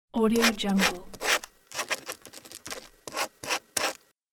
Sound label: pen drawing; fxprosound